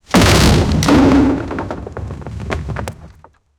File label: giant troll roars and sma